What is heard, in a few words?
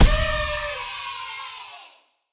Pinata attack